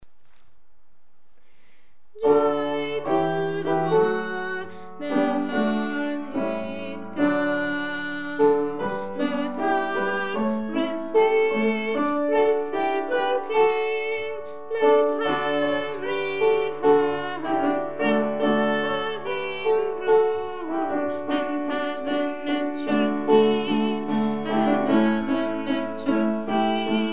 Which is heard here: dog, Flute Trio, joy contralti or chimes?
joy contralti